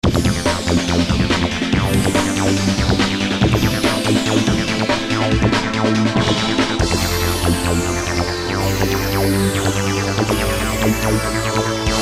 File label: sound logo; SynthMania